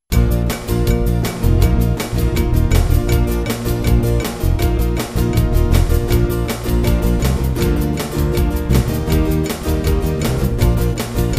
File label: accomp; HISWords Music; Psalm 27 4; No Vocals